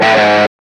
guitar hards

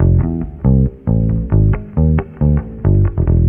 bass frenzied, Loops

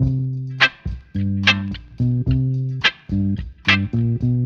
bass loud plucks, Loops Phonky

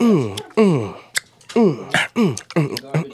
gum chewer, Beatbox; Drum loops

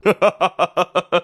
laugh

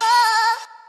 "oh", sung; Voice

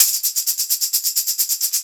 Drum loops; Just Add Drums

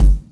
Kicks Loud

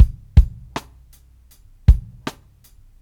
blessing, Drum loops Boombap

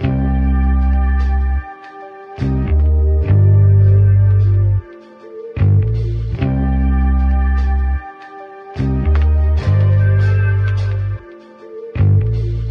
guitar, Loops; Phonky

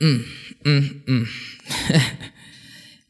mmm mmm mmm heh heh; ooh ahh

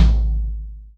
tomb, Kicks Medium

